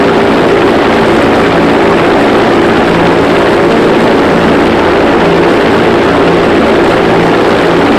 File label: engine bomber mid; engines; War Thunder